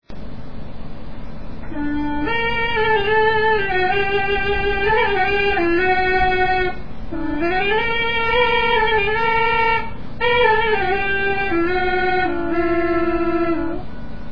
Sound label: arghul; Etnologia